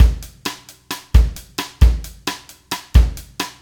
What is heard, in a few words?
Acoustic Drumloop